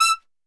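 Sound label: E4 trumpet shot, Brass Super Section; Best Service; TRP SHOT; 90 s Sample CDs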